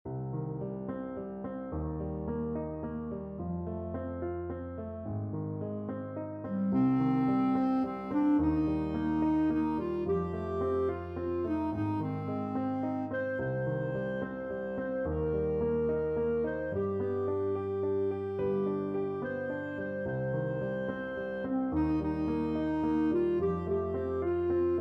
House of the rising sun, clarinet

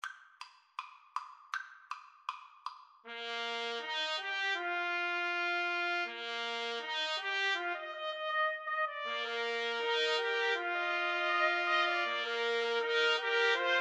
la bamba, Trumpet Trio

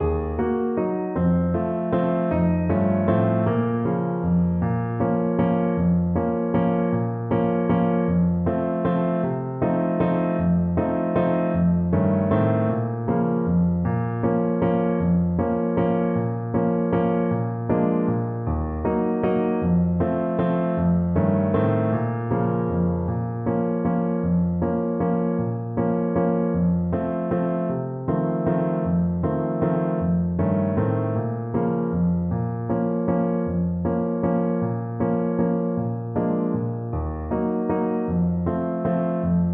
tumbalalaika, Trumpet

